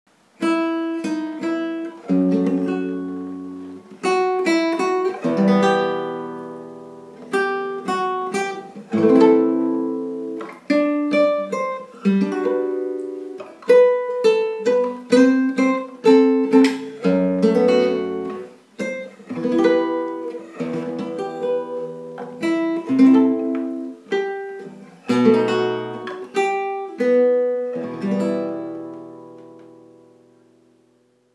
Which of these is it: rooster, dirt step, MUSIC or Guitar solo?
Guitar solo